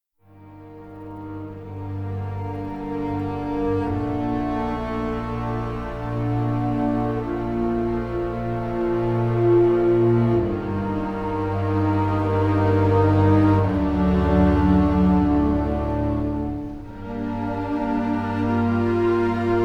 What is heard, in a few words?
Minimalist Piano and Strings; Manchester By The Sea 2016; movie